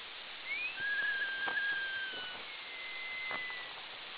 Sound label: Mouse vocalization audio; Sinelnikov